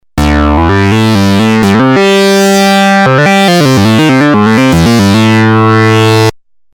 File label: polivoks hard lead